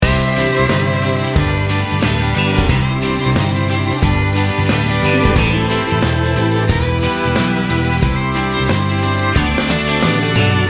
country road loop

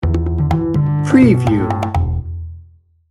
criminal chaos sting bumper

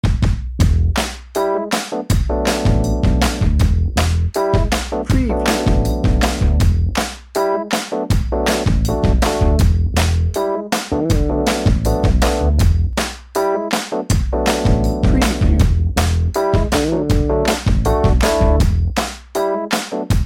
jazzy feet bed

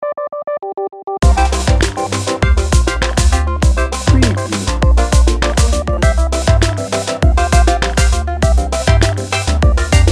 electro motion

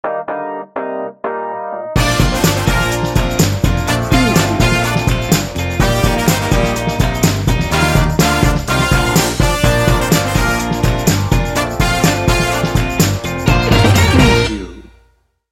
happy upbeat retro soul

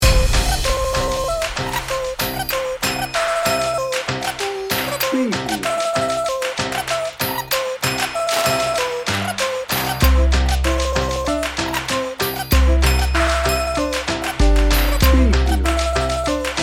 percussion party

